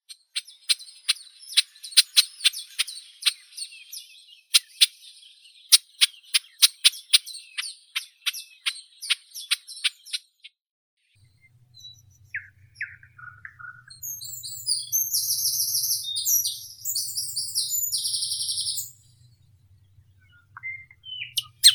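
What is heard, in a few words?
marsh warbler, Acrocephalus palustris; kamishovka bolotnaya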